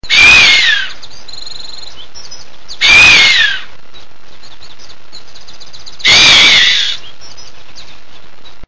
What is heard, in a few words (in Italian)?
verso camoscio